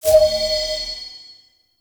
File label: Courier Pickup; Warframe; Duviri; Courier Delivery